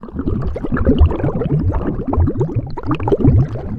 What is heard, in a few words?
bubble, Bathroom